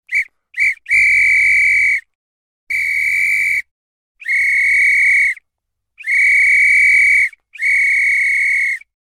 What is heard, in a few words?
Whistles Multiple Short; Household